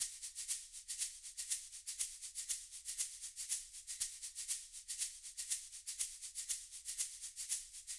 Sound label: 120 Loop Shaker; Subway Surfers City; Android, iOS